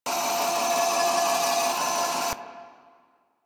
Turret Slow Idle; Warframe; Zariman

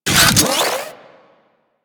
Air Pistol Equip, Weapons; Warframe; Corpus

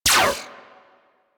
Shot, Weapons; Warframe; Moa Pet; Multron

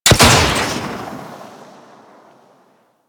Torpedo Pistol Shot 3D, weapons; Grn Torpedo Pistol

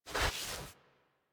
Foley Idle Ear Scratch Slow; Enemies; Kubrow; Warframe

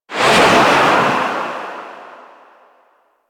Sandstorm Gust; Warframe; Mummy

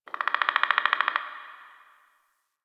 Forest Bird Mid; Warframe; Grineer Forest

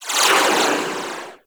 Swarm Missile Projectile By; DE; Warframe; Weapons; Sentinel; Helstrum

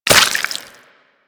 Proc Bleeding; Mods

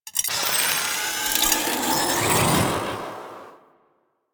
Tornado Cast; Warframe; DE; Sentients; Sentient Trooper

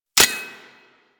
Pistol Mech; Warframe; Tenno; Guandao

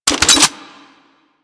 Twin Assault Rifle Reload; Weapons; Grineer; Warframe

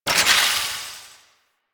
Alchemist Transmute Ability Device Stop; Warframe; Warframes

